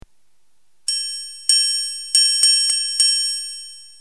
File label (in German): triangel